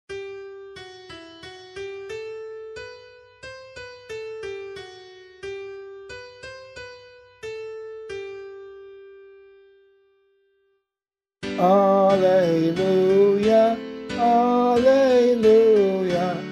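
vocal, Gospel Acclamation for Australian Catholic liturgy; The Dedication of the Lateran Basilica: Gospel Acclamation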